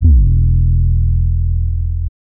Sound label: Woofer 808